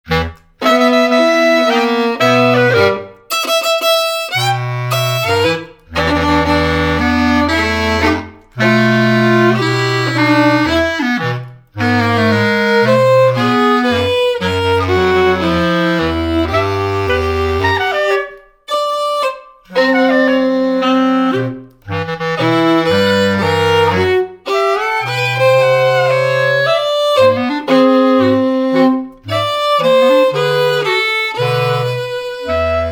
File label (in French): Violon et Clarinettes; Etude n1 pour Violon et Clarinettes